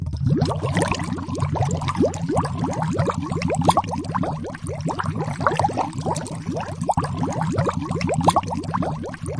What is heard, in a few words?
bubbles n bubbles; Atmospherics